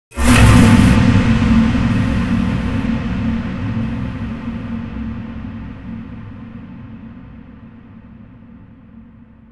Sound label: scary scary; svencoop; zombie nights